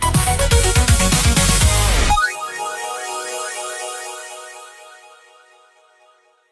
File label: gameover